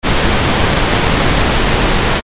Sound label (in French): bruit rose